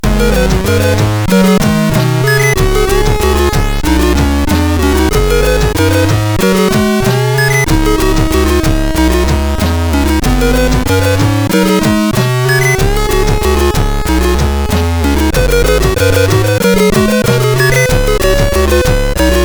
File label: Small Tune, CSG8580; sid; CSG8580R5